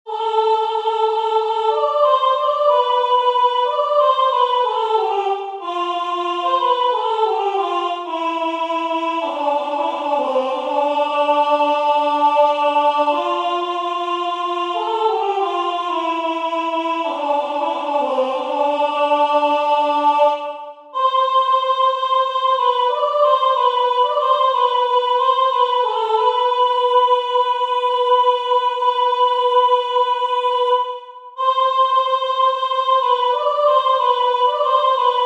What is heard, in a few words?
soprano